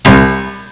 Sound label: piano key low